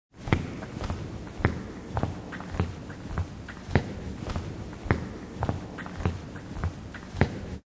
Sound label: Walking in Jeans through Gym, Walking on a gym floor in blue jeans; Footsteps (Sound Effects:Human)